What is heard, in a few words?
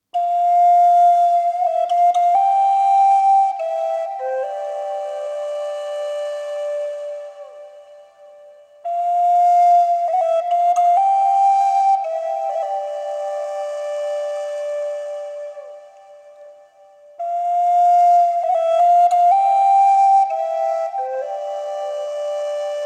Forest Flute Solo; Friends & Dragons (Original Soundtrack); Those Who Remain